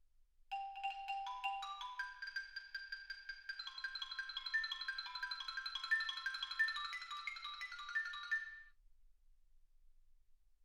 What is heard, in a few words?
xylophone